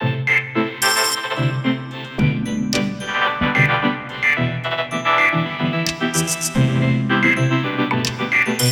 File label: 110bpm Mashed Drums